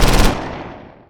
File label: machine gun; Weapon